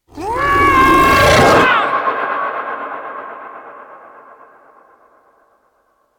Focus Operator Cast; Warframe

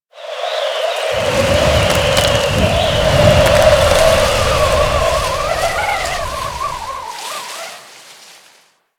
Settlement Wind Debris One Off; Ambience; Warframe; Grineer Settlement

